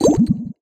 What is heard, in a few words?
Retro FX; Cymatics; Video Game Sample Pack Vol 1